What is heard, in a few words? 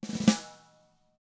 ROLL, SNARE ROLLS; ILIO; Double Platinum Drums 2